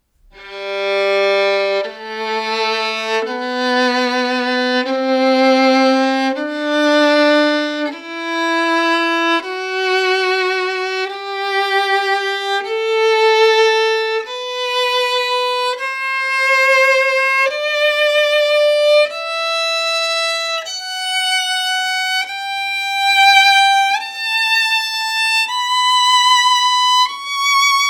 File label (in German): Tonleiter, Geigenbau; Violins; Sebastiano Goio